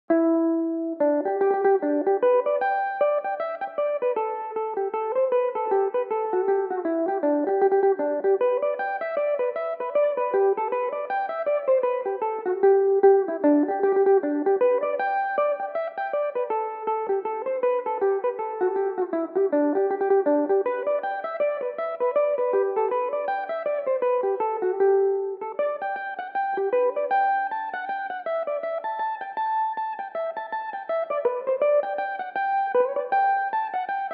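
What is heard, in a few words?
reel, G major, mandolin; The Belharbour Reel; Belmuse EM-190 electric mandolin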